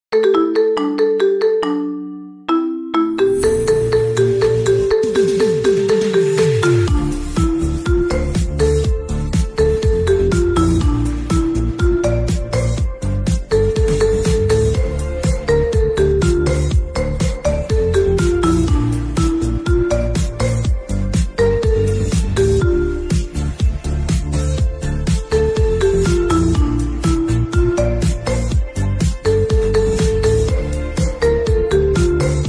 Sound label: Marimba Remix